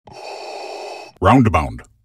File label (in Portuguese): respiro